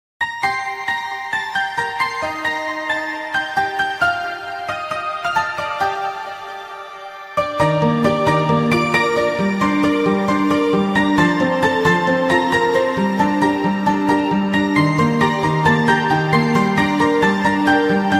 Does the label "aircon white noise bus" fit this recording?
No